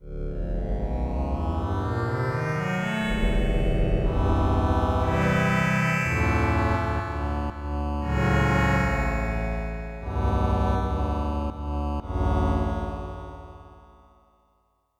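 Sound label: Slow Feedback, fuzz guitars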